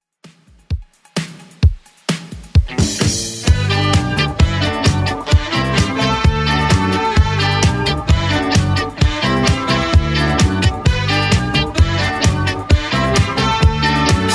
80s Redrum 130bpm

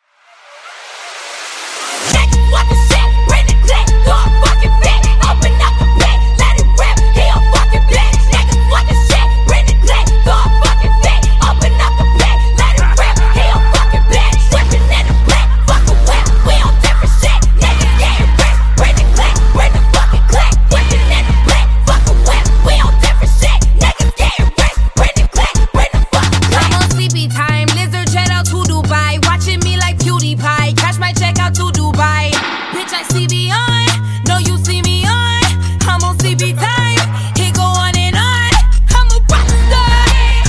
Acapella Intro Break Outro Dirty 77 5 Bpm

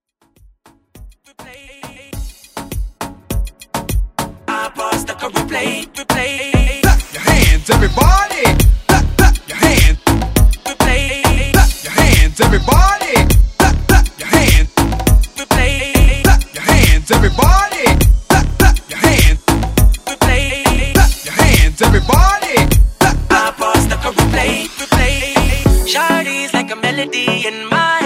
Moombah

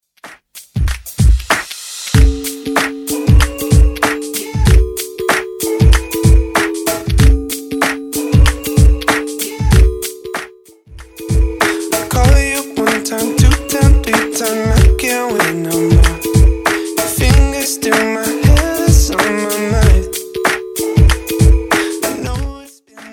Top40 Redrum 95bpm

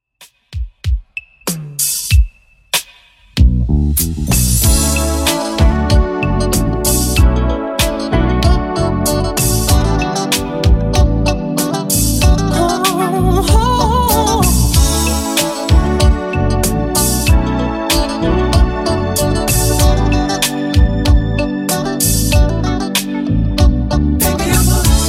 70s Redrum 95bpm

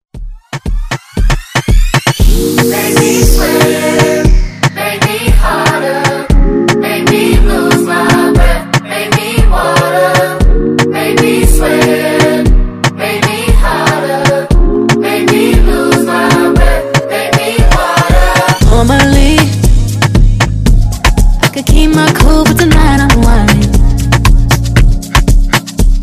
Afro Redrum

